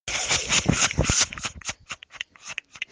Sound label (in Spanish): risa ho ho